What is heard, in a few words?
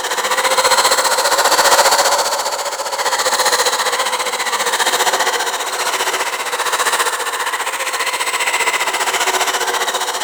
Bolt Snooze Loop; Warframe; Ranger